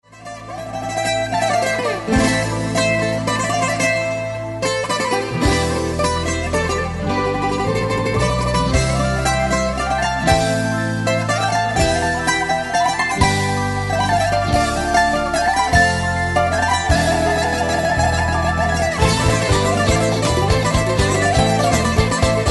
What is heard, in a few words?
Bouzouki Solo; athens